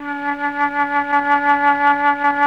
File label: MFLT 4D3, MELLOTRN; Sonic Reality; Vintage Timetraveler